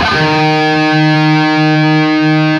LEAD D#2, Guitar; East West; Steve Stevens Guitar Samples Collection

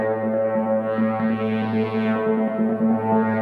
Hondo Fuzz FX 140 A, guitar drone samples 140bpm

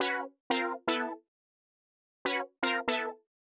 120 Stabs D, Keys, Nu Jazz House; PowerFX

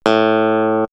Clavinet, keyboard; X-Static Goldmine; backtothe70's

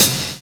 END ROLL; Univers Sons

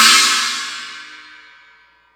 CHINA, Drum Dry; East Collexion; Drum 1 Dry

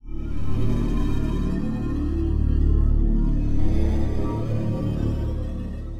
Dark Synth, cinematic drama soundbeds; musicradar

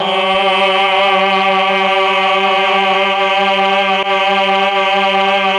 CHOR; ILIO; Propeller Island Legendary M400

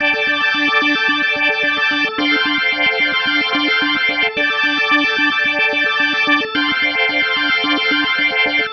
Psychedelic, Organ, Loops; Sonic Foundry (Sony Creative Software); Joe Vitale Organ Donor

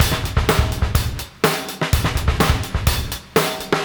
Beat Loops; Zero-G; Monster Beats

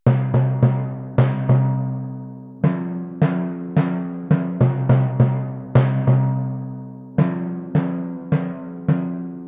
timpani, Cmin; PowerFX - Downtown Orchestra; Orchestral arrangements

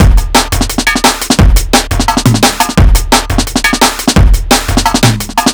E Beat, Electronic Beats

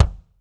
BD, Drums; Bob Clearmountain Drums I

